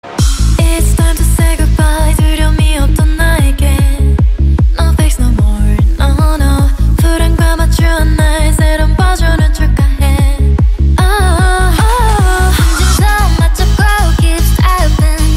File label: kpop